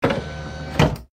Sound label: Car slide close; Nokia Ringtones; Audio themes